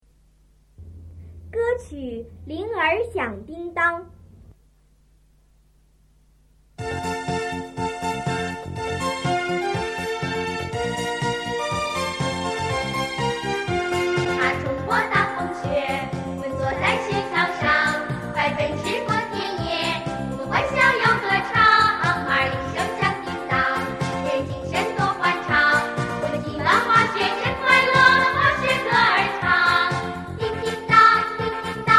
Jingle bells

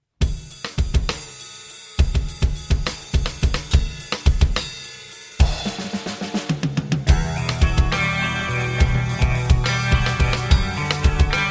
piano version

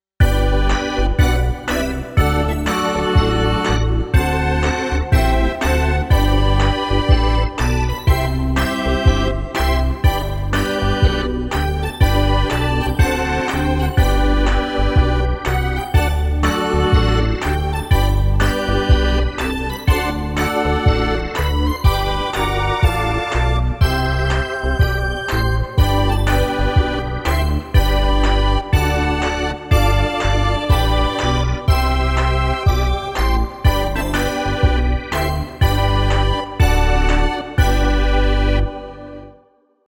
Baseball Ballpark Organ Version

